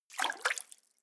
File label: step water sneak in